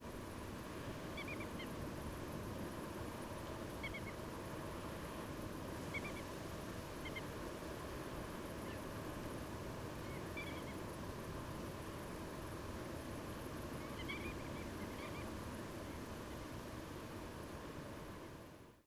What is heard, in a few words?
Bar-tailed Godwit; Portland